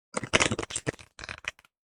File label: Shuffling playing Cards